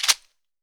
CHEKERE; AKAI S-Series CD-ROM Sound Library VOL-5

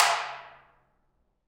PRC AMB, Africa, Hand Drm; Roland LCDP14 Africa VOL-2; Ambient Tar

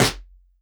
Elektron Monomachine, Drum Machines

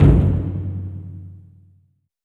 oo daiko, taiko; AKAI S6000 CD-ROM - Volume 5

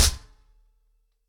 90BPM, ACOUSTIC DRUMS

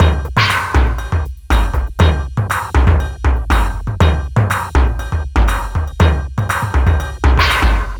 cymbal init 120bpm

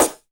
SD, dance drums hip

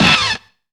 SKID STAB; Zero-G; Funky Elements

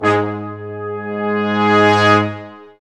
BRS, Lrg Ensemble; Roland L-CD702

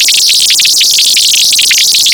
HIGH BURBLES; Zero-G Ambient 1

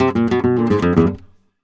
gta e, Flamenco, Guitar, Picado

